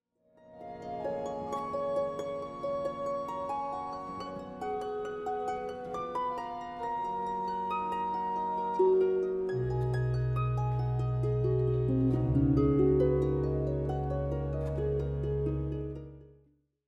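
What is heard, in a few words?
Notturno, harp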